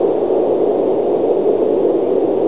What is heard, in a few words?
wind; TNPDEMO